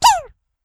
railgf; QZDoom

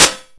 spark; GZDoom

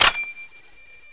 clip out, weapons, garand; nzp

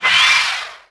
leap; weapons

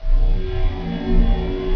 drone; world; q3rally

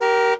horn; Q3Rally

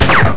PISTOL; greed

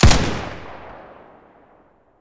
machine gun, weapons

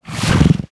role3 move; player

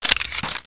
mag out, weapons, ppsh; nzp

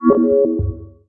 button click release, ui

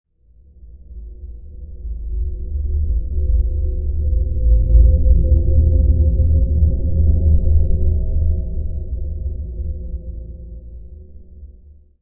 empty howl, ambience

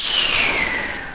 GRENADE; greed

